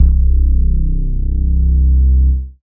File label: bass, c, Sub Bass; ProSamples vol.37